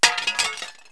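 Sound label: small break, destruct; Wired for Sound Pro CD; Aristosoft, Inc.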